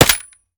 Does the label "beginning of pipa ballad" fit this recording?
No